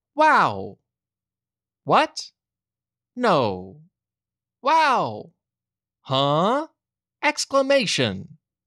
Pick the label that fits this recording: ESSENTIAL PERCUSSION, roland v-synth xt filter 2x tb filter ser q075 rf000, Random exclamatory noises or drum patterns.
Random exclamatory noises